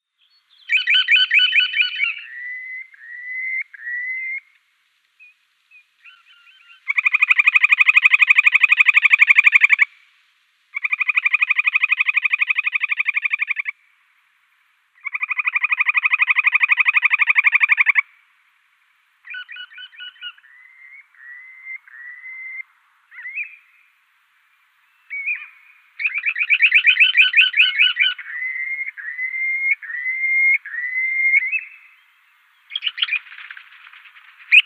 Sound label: Dunlin male display flight